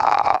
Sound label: TB THROAT, Talk Box